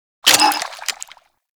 Post Shot; Warframe; Scaldra AK

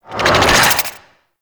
Tendrils Cast; Warframe; Infestation

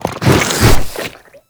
Strike Flesh; Enemies; Abilities; Warframe; Proto Infested Ancient

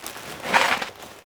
Hunting Bow Load; Warframe; Foley

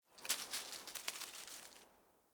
Sit Spawn Squirrel Run By; Dendria

